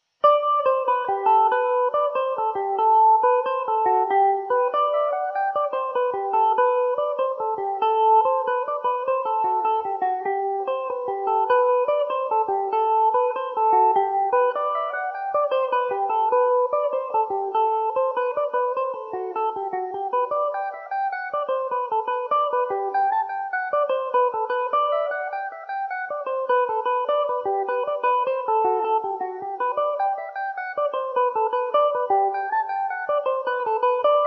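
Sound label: G major mandobird, irish mandolin; boiled goats milk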